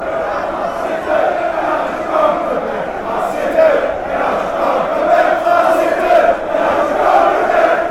den haag chant

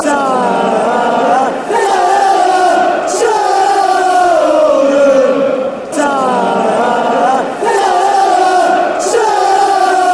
nah nah, chants; oldham

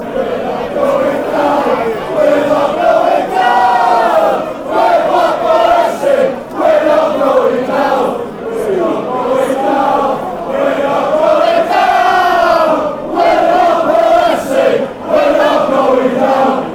were not going down, fan chants; blackpool

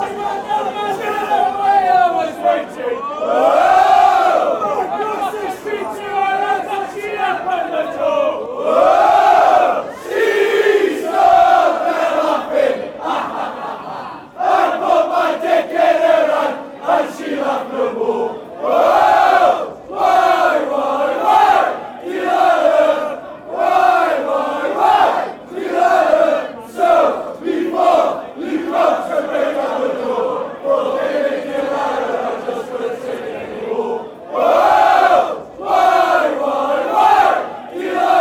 fanchants, football chants, anthems

